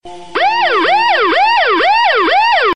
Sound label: police siren short; alarmas